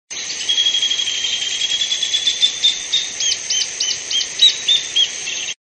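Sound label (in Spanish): voz hornero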